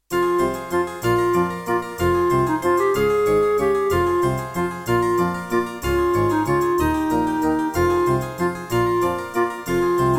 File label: Track 6, Roland RA-50; Death Gate